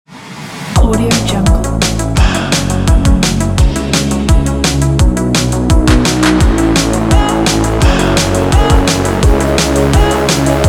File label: synthwave weekend pop